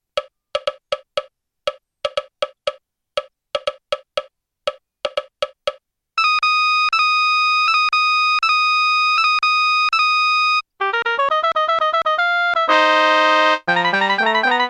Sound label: Jazz Classic Balkan